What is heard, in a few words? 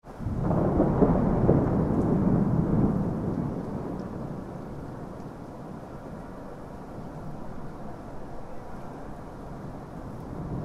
thunder, soundscapes